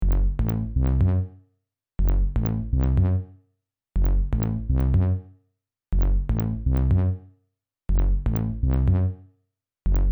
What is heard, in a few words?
tuba bass